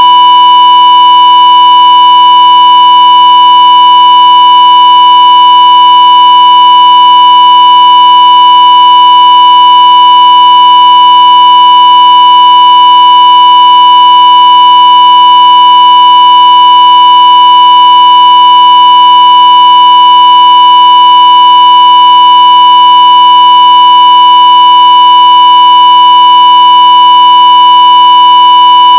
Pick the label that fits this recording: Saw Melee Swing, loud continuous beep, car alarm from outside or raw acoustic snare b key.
loud continuous beep